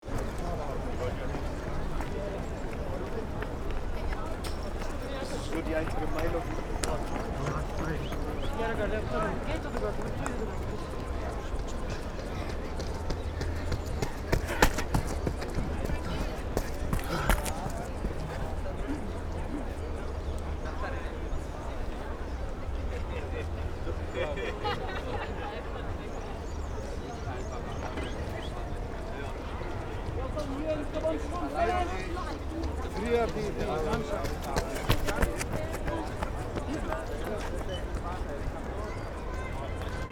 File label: Park ambience people running distant traffic